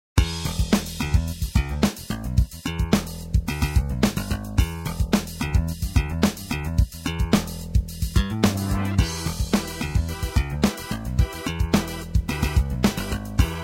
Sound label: funky; 게임음악데모(3) FUNKY01